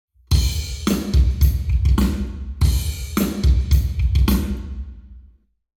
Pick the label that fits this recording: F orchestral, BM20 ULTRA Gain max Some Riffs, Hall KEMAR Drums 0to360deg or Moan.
Hall KEMAR Drums 0to360deg